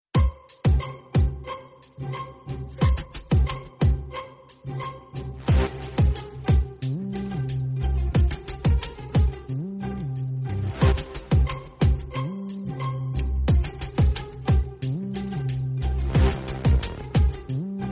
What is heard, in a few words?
Music Telugu Ringtone